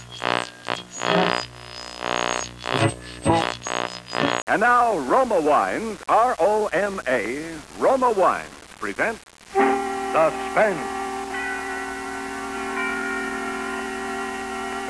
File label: SUSPENSE